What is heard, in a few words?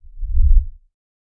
Subwoofer Sngl Whoosh